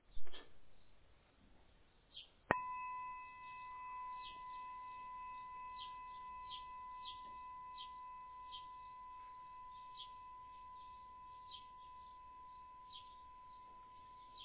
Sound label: bronze bowl, singing bowls